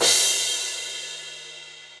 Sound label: Crash 17 Ambient Loud Punch, Cymbal; Drums; Drum Hits; Standard Hits; Session Drums Studio